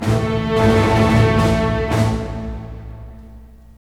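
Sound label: 112 war, orchestra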